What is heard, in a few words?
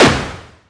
punch